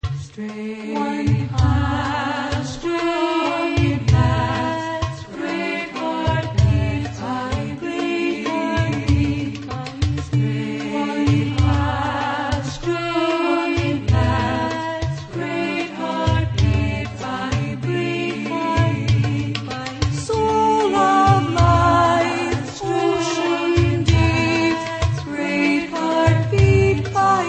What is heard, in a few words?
heartbeat heart beat